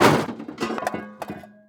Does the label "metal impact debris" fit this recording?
Yes